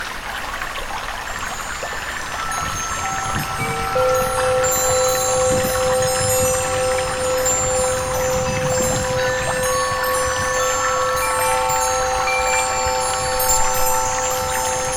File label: chimesong